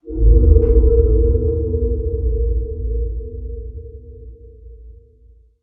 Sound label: Ambient; Barotrauma; Room FX